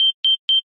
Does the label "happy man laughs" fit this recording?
No